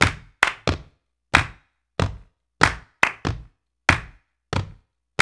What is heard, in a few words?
World Dance; OZ!